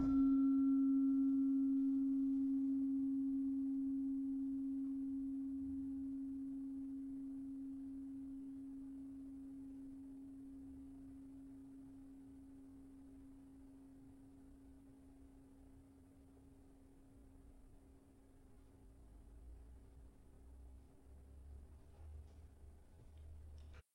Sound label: Slentem C sharp 4, gravity harps tuned